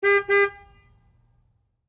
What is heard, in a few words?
Truck GMC Savana Horn Beep Beep; 2010 GMC Savana; Vehicles; Trucks